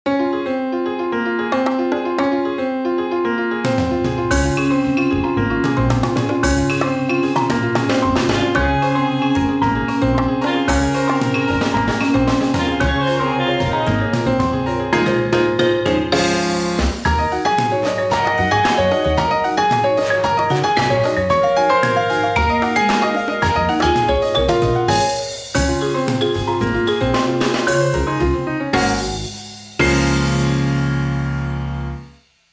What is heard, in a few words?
Piano Mini Theme